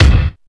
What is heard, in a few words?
treble rich kick drum single hit c sharp key